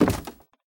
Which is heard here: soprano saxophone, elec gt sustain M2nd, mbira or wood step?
wood step